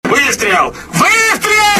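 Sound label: shot shot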